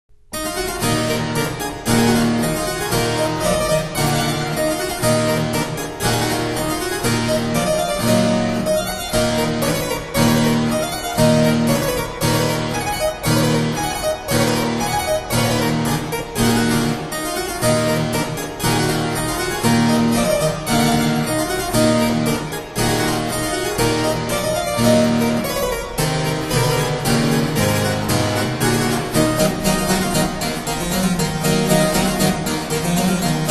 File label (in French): tambourin; rameau